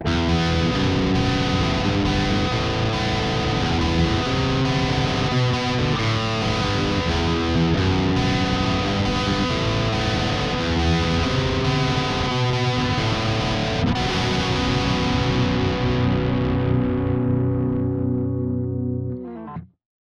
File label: Peavey 5150 4x12 EQ; Liquid Profiling; Kemper Generic